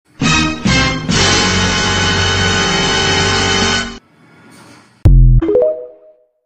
suspense

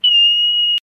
aaaaaaaaaaaa

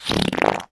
queef fart

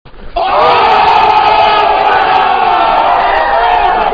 woooooooo

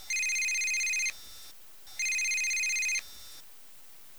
standard ring ringtone; gta iv

